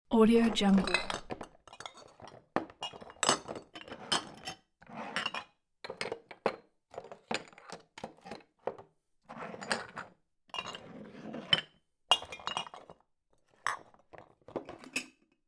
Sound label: moving jars in pantry; domestic sounds